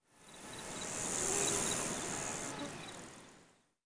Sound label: CRICK, nature; zones; Maxis XA Audio